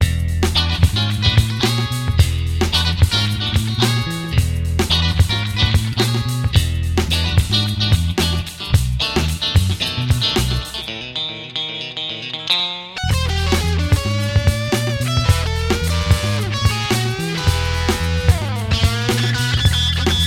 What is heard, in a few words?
Funk Machine